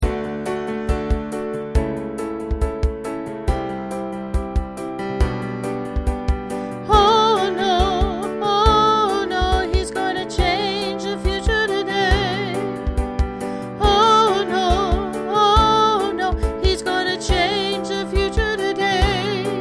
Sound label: vocals